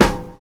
NO RING; Univers Sons

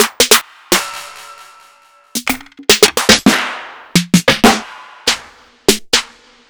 808 trap Snare Drums

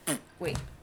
Mouth Fart

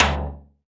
Surdo Rim, Percussion; Roland BK-5

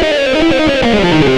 xtra lead; Sonic Foundry (Sony Creative Software); Ugly Remnants VOL-2

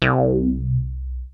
XRAY BASS, mono poly; TECHNO-BWARE

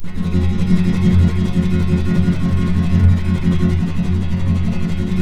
G M, Guitars; Spectrasonics; Hans Zimmer Guitars VOL-2; FLAM TREM CH

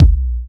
Kick, Kickdrum; Equipped Music; Loungin House

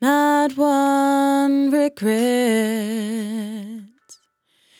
100bpm Bm, Velvet Vocal; Zero-G

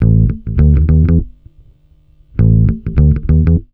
bass, Roots Funk; USB Soundscan vol.41; AKAI

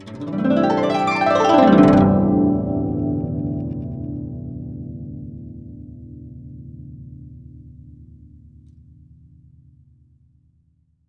GUCH GLS, GUCHIN; INIS - Opium; GUCHIN-GLS

